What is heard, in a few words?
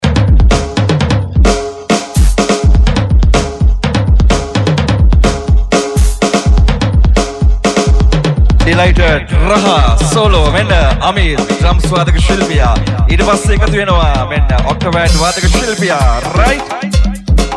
Band Solo; ShaaFM; Sindu Kamare; Final Friday Attack Show